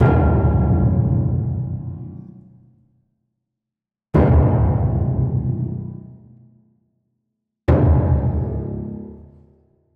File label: piano FX damped cluster; Cartoon - film effects